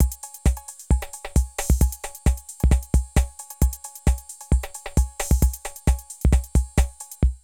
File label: Drum loops, Euro techno